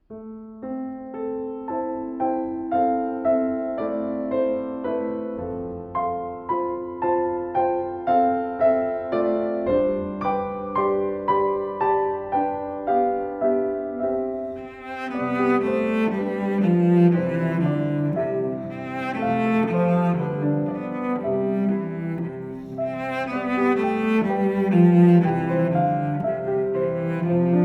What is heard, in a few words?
Saint Paul's Steeple English folk song; arr Jason Gray; Celebrate Theory recordings